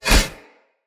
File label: Curved Sword Whoosh Left Right; Weapons; Player; Swords; Sinecure